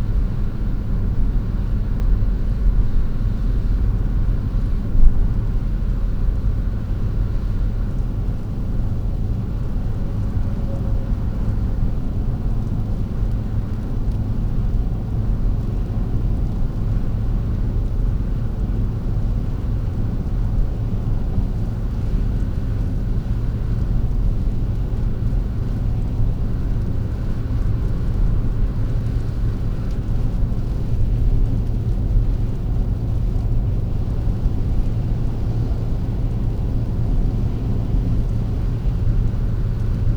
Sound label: Marea pioggia 140kmh, Car noise